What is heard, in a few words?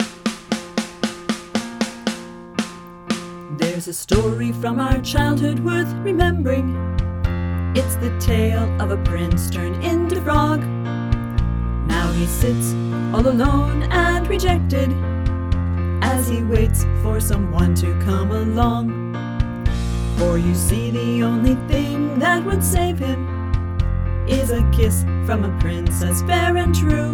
with clicks